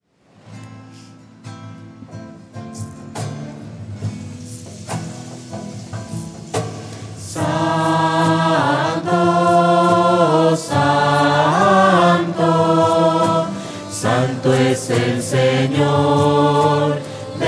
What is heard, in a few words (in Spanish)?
santo lento y triste, voces; misa